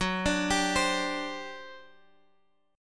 G Major 7th Suspended 4th, Gb

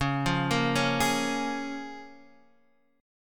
C sharp 6th C sharp down Guitar DADGAD

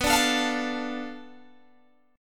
B Minor 7th Flat 5th B, Guitar Open G

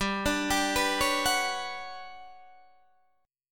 G Major Sharp 11th G, Guitar Standard; GM#11 arpeggiated

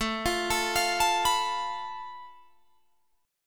A Suspended 2nd Sharp 5th, Guitar, Drop D

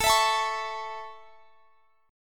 A Major A, Guitar Standard; x,x,19,21,22,21; strummed; capo=9

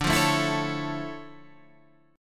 D Minor Major 9th, D, down, Guitar Standard; DmM9; strummed; JGuitar

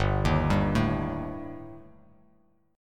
G Suspended 2nd Sharp 5th, Bass Standard; arpeggiated; JGuitar

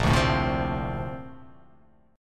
A sharp Major 9th

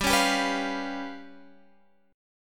G 7th Flat 5th

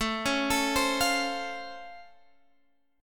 C sharp Augmented Major 7th A, chord

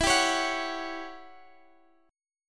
E Minor 6th Add 9th guitar chord, Open F; Em6add9; strummed; x,x,16,14,16,13

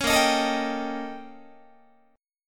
Bb Minor Major 13th Bb, Guitar; BbmM13; strummed; 16,18,18,19,19,18; JGuitar

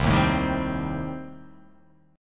C Minor 6th, chord

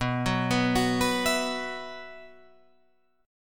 B Suspended 4th, Guitar, Open F; 11,13,11,13,14,11; arpeggiated; JGuitar

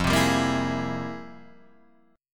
F Minor Major 11th, Guitar, Drop D

